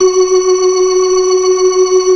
FAST VIB, ORGAN, TREMOLO VIB

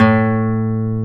guitar classical guitar, nylon string; GTR; Roland LCDP02 Guitar and Bass

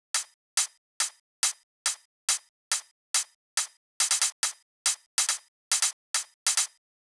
drum Loop 140BPM HH; Multi Drum Loop

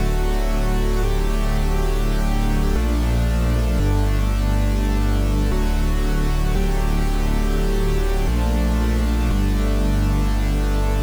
dnb chord Dmin74

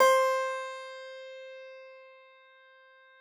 Thumb Tack Piano ff, C3; ThumbTack Piano ff -ped; Best Service ProSamples vol.53 - Classical Prepared Pianos; Total Piano

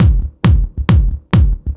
Kick, Trance, Drums

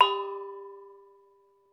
Sumatra Gong; Club-50 - Foundations Roland; ExotcMetal

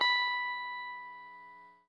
Guitar & Bass; Quantum Leap; 90's Sample CDs

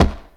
Kick, One Shot Drums; Drum & Bass

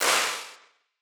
Wider Clap; stereo toolkit

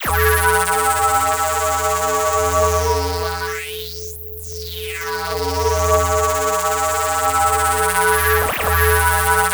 ring zap, ring mod; Spectrasonic Distorted Reality 1

